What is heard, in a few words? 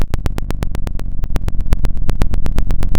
pop, Sound of a fire crackling